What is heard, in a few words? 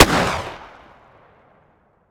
shotgun sup fire atmo ext; gmod; viper